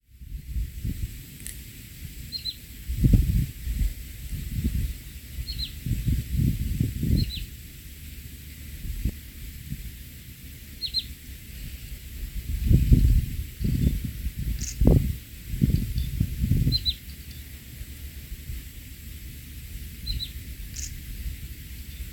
タカブシギ 鳴き声; 新潟県新潟市中央区日和山; bird song

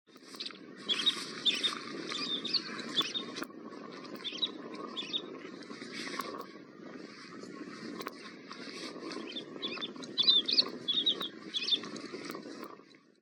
イワヒバリ 地鳴き; イワヒバリ科; 長野県木曽郡 木曽駒ヶ岳; bird song

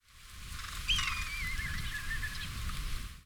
tobi, bird song

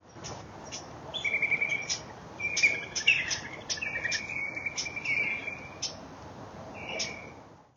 akaasisigi, 鳴き声; アカアシシギ; 沖縄県那覇市 漫湖; bird song; J-eco 鳥のさえずり図鑑

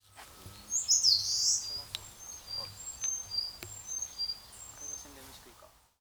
ezomuisikui, bird song; センダイとエゾ; さえずり; 新潟県新潟市中央区 鳥屋野潟公園; J-eco 鳥のさえずり図鑑

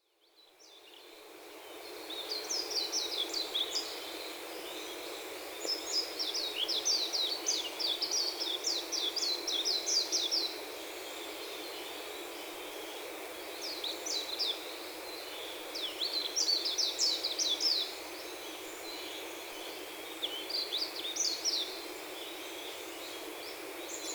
meziro, bird song; メジロ; さえずり; 新潟県新潟市中央区 鳥屋野潟公園; メジロ科; J-eco 鳥のさえずり図鑑

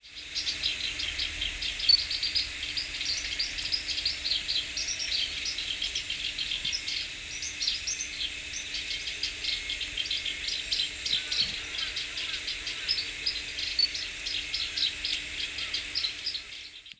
koyosikiri, bird song

